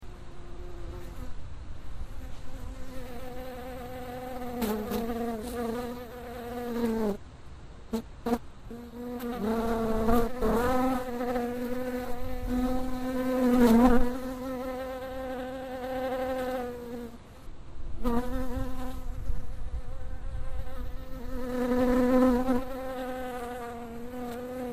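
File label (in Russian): звук мухи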